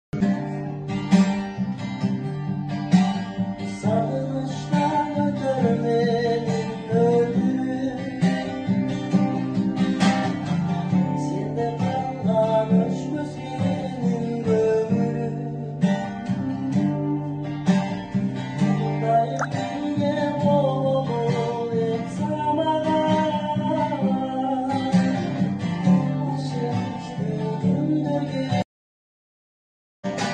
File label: gitara